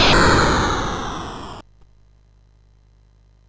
Project Firestart, MOS6581R4

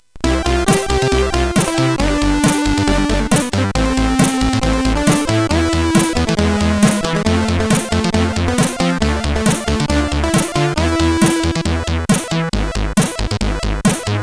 Congrats, sid CSG8580R5; hvsc

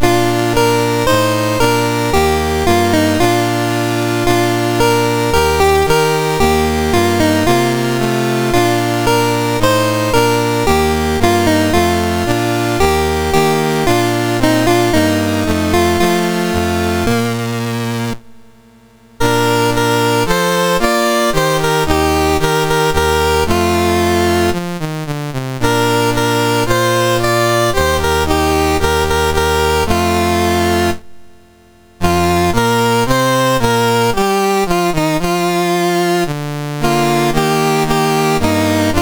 Upper Volta, National Anthems; MOS6581R2; Dave Marquis

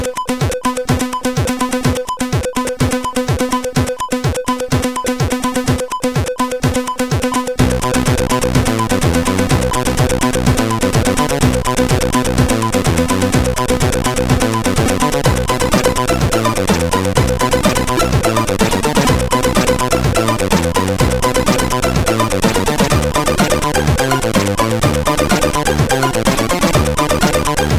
Very Old Crap, CSG8580R5

